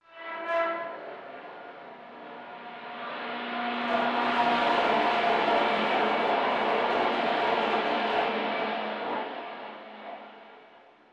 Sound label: city